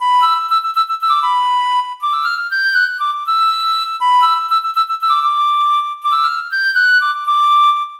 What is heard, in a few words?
Flute